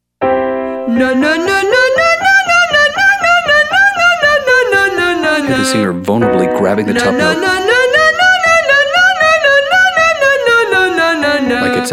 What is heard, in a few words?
stutter step female